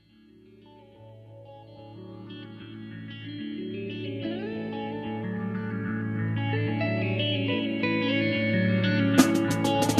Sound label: Transistor